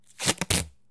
attach; Kerbal Space Program; KIS

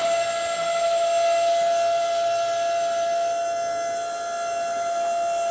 telescopic Motor; Kerbal Space Program; KAS

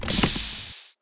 helmet Off; Kerbal Space Program; KIS

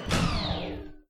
winch Med Motor stop; Kerbal Space Program; KAS

